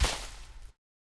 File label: Animal Foot Large; Civ4 warlords